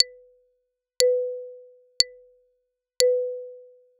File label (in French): percussion